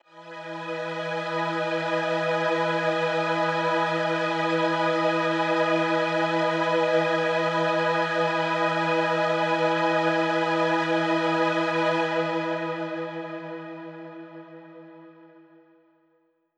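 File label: Mach String E major, synth orchestra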